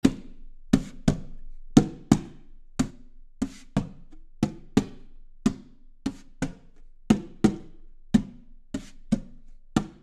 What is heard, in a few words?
Roland Dimension PERC Hardware